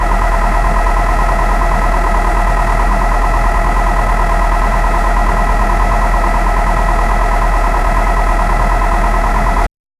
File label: medium gear diesel bus engine